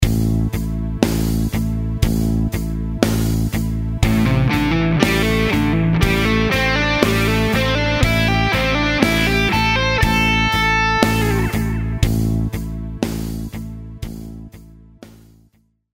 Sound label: Lick 60bpm